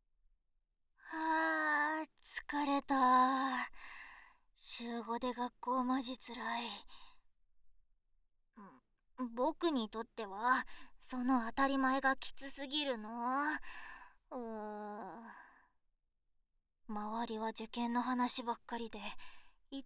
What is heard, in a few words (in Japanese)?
耳舐め手コキ; 耳舐め好きなの気づいてるよ?; 左右反転